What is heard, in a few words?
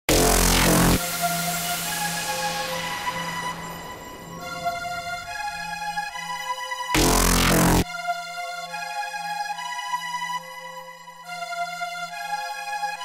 Dubstep